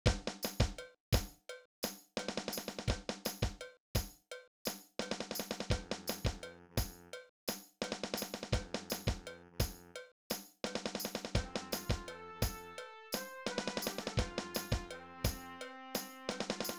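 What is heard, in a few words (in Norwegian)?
Brure marsj lavere percussion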